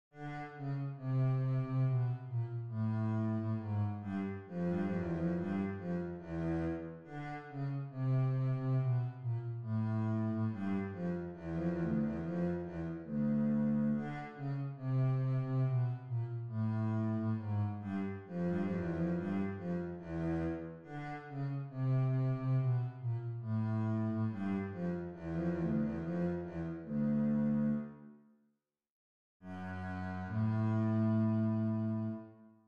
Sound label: habanera bass